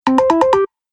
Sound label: Message Notify Sound, Dong